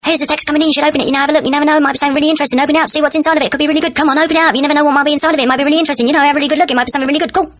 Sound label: Fast Sms